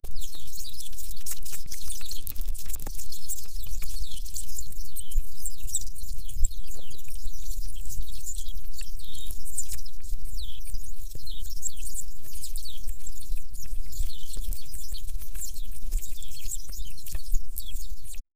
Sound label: flying bird feather sound